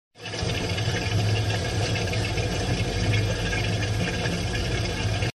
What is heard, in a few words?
Rattling Sound